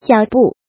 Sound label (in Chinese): 脚步